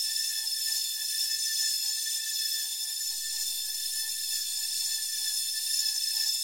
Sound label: amb abstract whispy lp, ambient; Half Life Model Pack